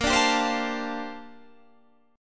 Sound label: G Minor 11th A sharp